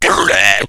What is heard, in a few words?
pain, slender shiver